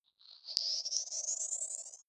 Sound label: sci-fi power on